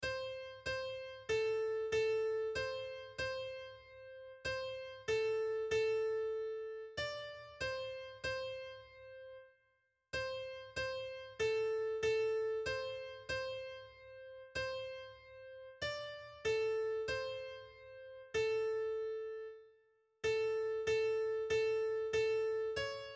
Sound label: Ave Maria T mid; Arcadelt